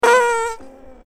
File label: playful squeaky fart